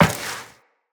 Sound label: Step Metal Perforated, Footsteps, Walk; Tenno